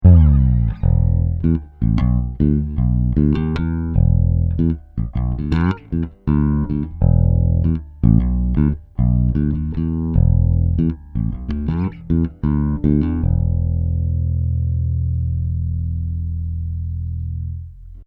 Bass Instrument Input

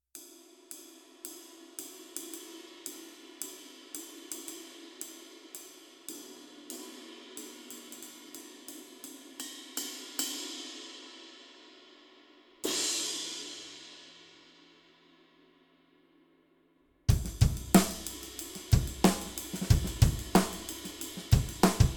Big Apple Dark Ride

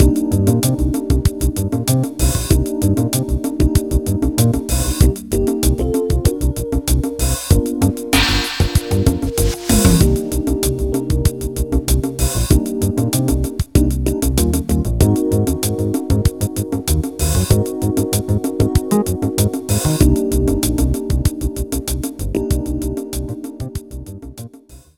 Urban

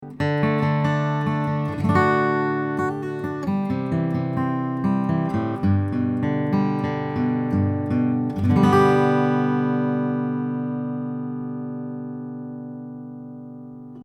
Omni Picking A

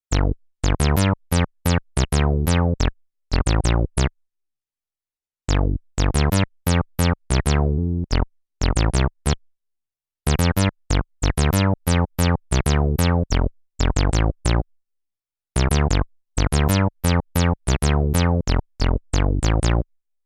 Bassline 3003

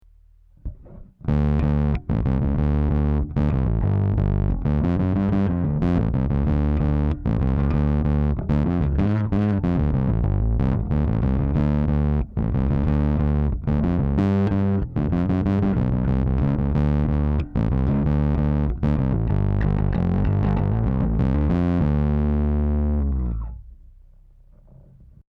Bass Station Grit

